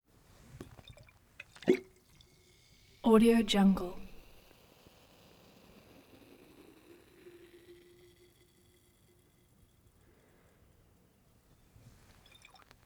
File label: bottle drink glass 16oz soda or beer up to lips; prosoundfx